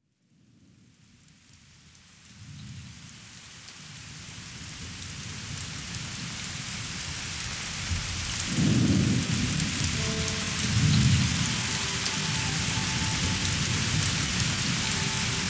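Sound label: Zvuk dozhdya, rain sound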